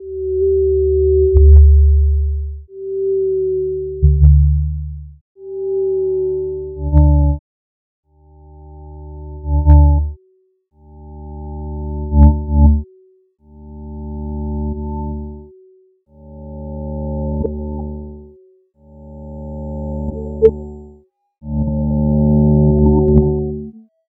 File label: bass evol progression